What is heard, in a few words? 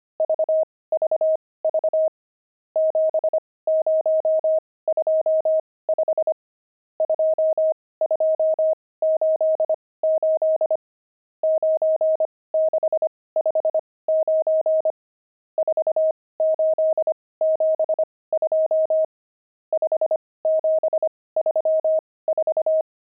pangram, intermediate pangrams files